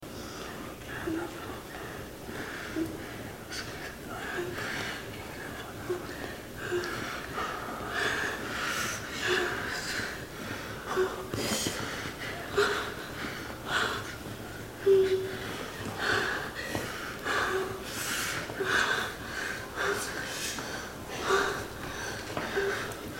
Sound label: moan